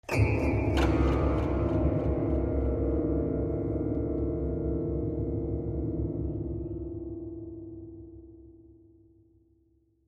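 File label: Grand Piano Strings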